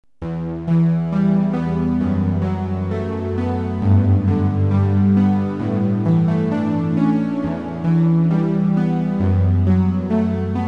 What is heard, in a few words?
mellow pad